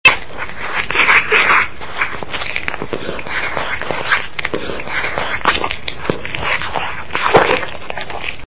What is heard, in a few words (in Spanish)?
demonio tasmania